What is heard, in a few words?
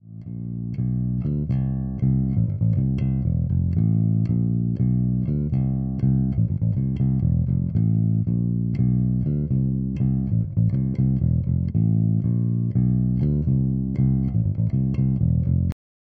Zoom B2 Four EBH 300